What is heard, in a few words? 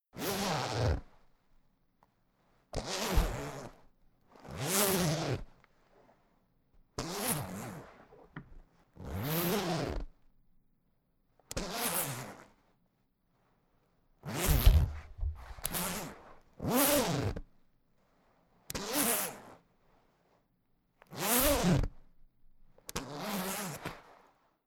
zipper